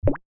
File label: click